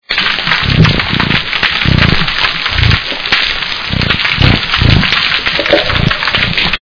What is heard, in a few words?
croaking